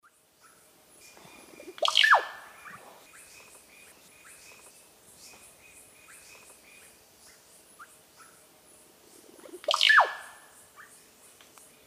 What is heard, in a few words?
Psarocolius angustifrons, song and calls; S. America; Eastern Lowlands